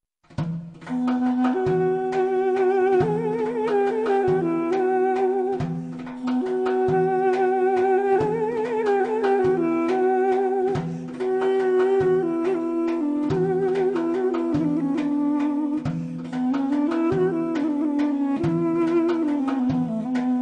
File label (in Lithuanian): Duduko melodija (Armenija)